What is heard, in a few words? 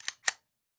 Click; This Is the Police 2